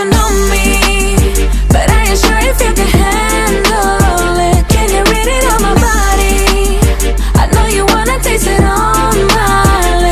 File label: Female English Ringtone